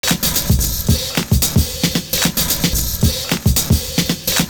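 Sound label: CLAP N DOUMB, 112 bpm; Univers Sons - Kitch 70s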